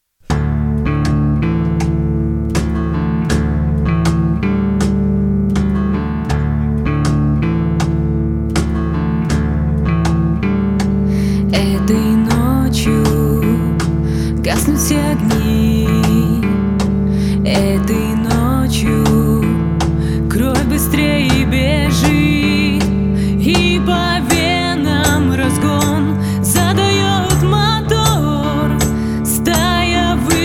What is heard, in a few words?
Acoustic